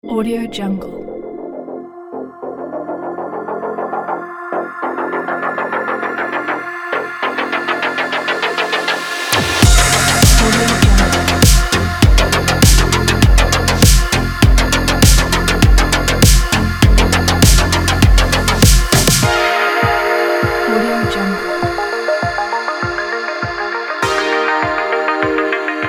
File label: fashion synthwave for sport tech